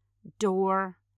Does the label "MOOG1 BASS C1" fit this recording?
No